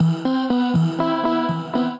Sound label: Air Tight Rack (Root E), Harmonic Vocal Racks; Instruments; Ableton; Factory Packs; Voice Box; Complex Vocal Racks